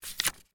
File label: page